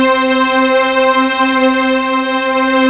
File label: PAD Oct Strng; Best Service Gigapack 1